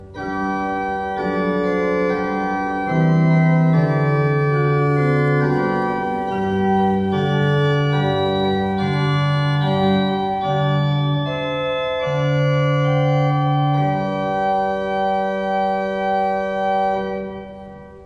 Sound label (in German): Walcker, gebrauchtorgel